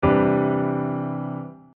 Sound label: C maj9 polychord